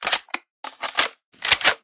ump9 reload, guns

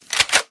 ump9 switch, guns

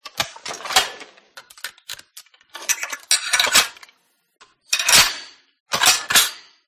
m249 reload, guns

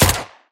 ump9, guns

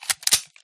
deagle switch, guns

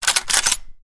mk12 switch, guns

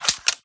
m4a1 switch, guns

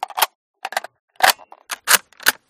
sv98 reload, guns

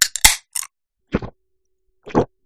soda use

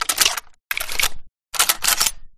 mk12 reload, guns

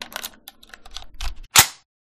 garand reload, guns

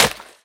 wood bullet hit

